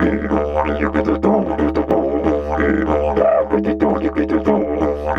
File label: Didge 93 BPM, Didgeridoo